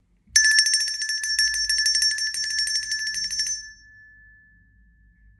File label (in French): clochette LA